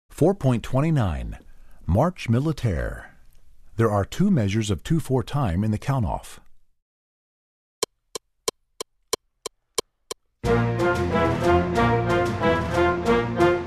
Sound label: Marche Militaire